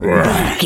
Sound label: lizard hurt moan